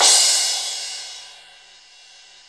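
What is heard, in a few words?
CYM CRA, crash cymbal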